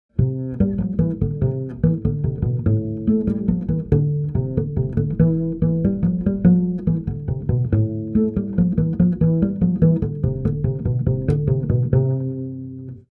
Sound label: bass play music; The Sims 2